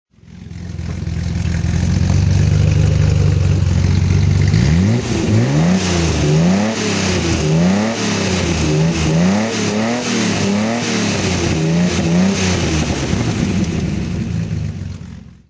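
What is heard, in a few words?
healey 100 6 throttle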